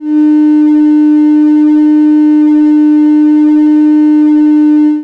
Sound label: 300hz lateral +18db, turntable tests